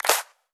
Pre-Shifted Claps; Vengeance Samples

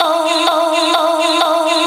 House Loops, Vocal Loops House 128BPM

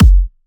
Crazy Chip Kick, 128BPM; Vengeance Samples

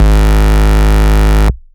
Ultimate Bass G# Root G#; Vengeance Samples

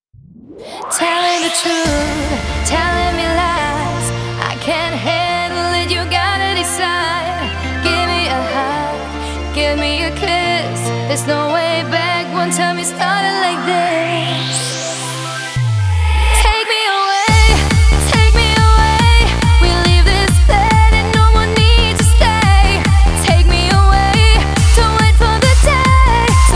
140 BPM Female Mix; Vengeance Samples; VTDS2 Song Kits; Female Take Me Away

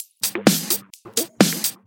House loops 128BPM; Vengeance Samples

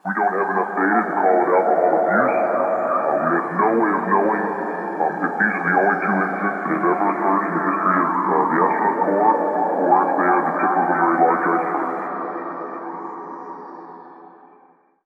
Ambiences, Places and Ambience

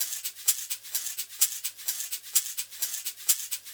Shaker Loop, 128 BPM; Vengeance Samples

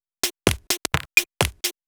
Electro Loop 128BPM; Vengeance Samples

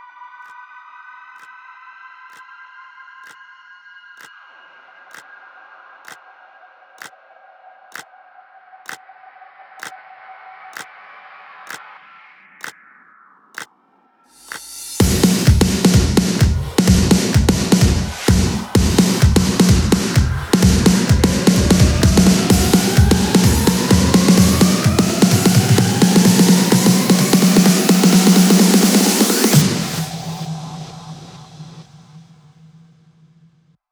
128BPM, House Electro Fills; Catchy 6; Vengeance Samples

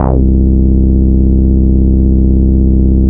Ultimate Bass Root C; Vengeance Samples

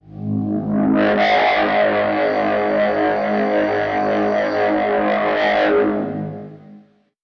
Tonal FX G#

